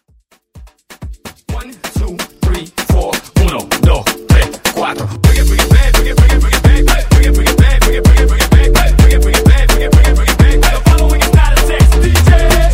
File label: Afro Hype Edit